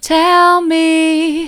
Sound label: tell me soft, vocal